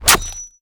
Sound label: Thow Scale, Damage